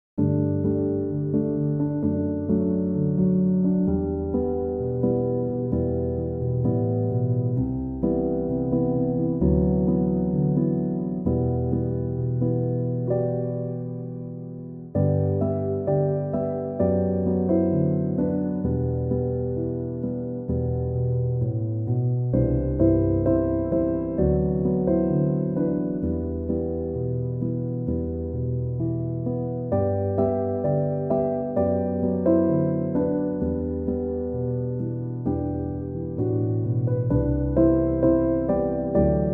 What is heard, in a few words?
chill piano instrumental